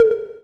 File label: Percs, Elektron MachineDrum; SPS1 MKII